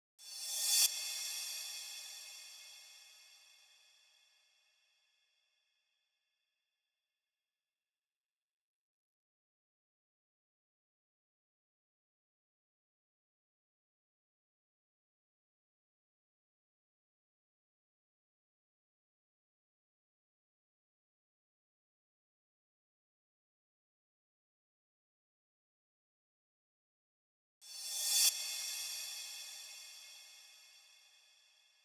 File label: Reverse Crash